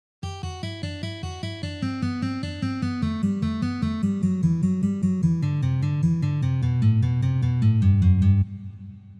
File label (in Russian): Uprazhnenie dlya igry gammy Mi minor